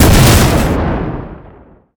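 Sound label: explosion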